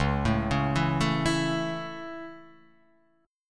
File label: C sharp Minor Sharp 5th, chord; C#m#5 arpeggiated; JGuitar